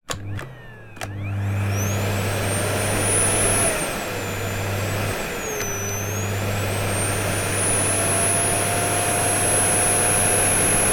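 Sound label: household vacuum cleaner on various attempts broken button run off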